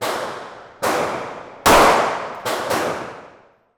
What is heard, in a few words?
gun shooting firearm shot out